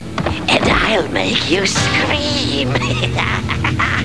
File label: scream